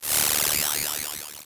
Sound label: Animation Electronic